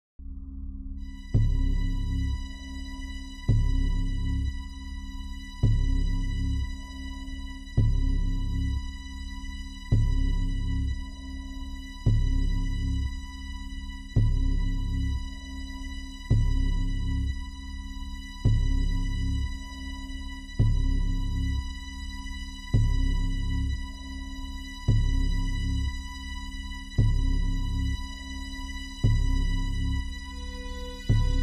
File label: Building Tension